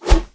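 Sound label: sharp cold short sound of sword swing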